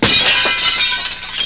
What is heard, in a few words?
glass brk